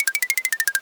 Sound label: reel spin